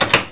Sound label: kachunk; Myst Demo; Myst Preview